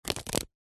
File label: foley card pile of beer mats stack